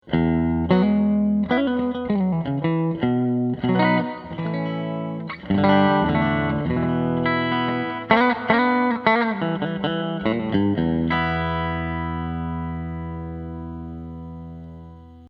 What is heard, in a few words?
H90 Bridge Clean; Porter Audio